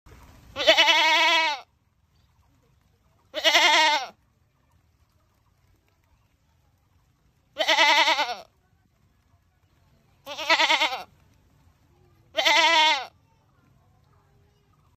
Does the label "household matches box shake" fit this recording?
No